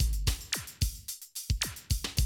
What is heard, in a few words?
110, Classic Drum Loops